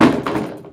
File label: Chair